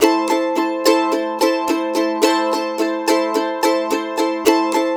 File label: Blues Guitar Samples, RIFF; ILIO - Fretworks